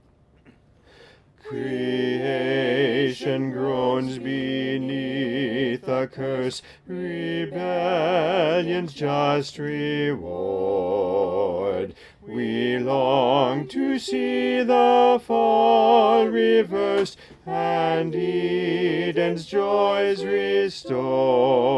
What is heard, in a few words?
Bass